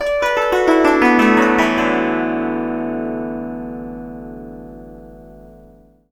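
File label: guzheng; Spectrasonics; Heart of Asia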